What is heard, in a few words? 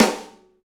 Pop Snare; Snares